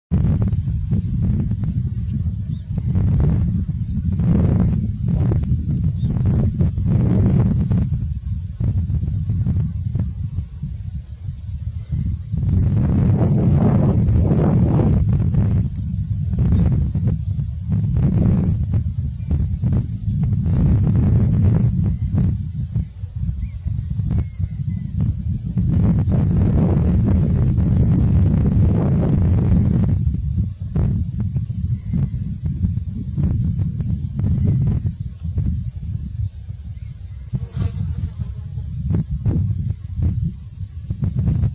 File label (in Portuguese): Canto do noivinha de rabo preto